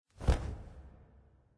flap; smite; kukulkan

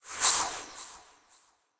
soul; Smite; Baron Samedi

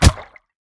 hit; smite; ganesha

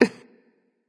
"heh heh"; smite; voicepack; nike

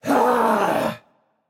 battlecry; smite; tsukuyomi

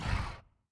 grunt; fenrir

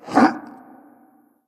hitreact human male small

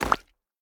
footstep; Smite; Tiamat

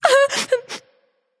boo hoo; daji

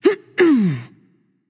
grunt jump; smite; amaterasu

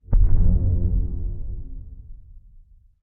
magic dark; smite; cabrakan

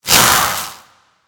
projectile; smite; god raijin

